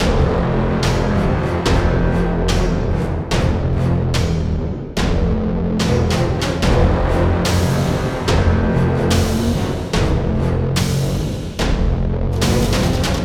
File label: Mini Boss Intro; skykeepers; ps4 windows xbox one gamerip 2017